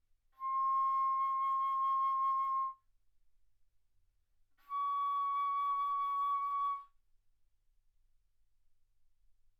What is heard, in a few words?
Bass Flute C6 Db6